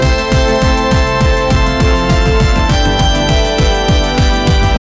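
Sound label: loud energetic pop celebration, festive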